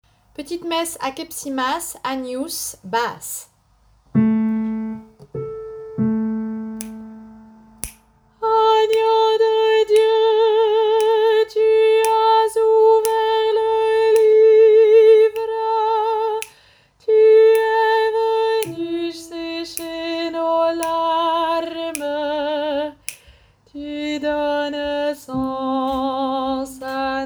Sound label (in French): Petite messe Agnus basse; Akepsimas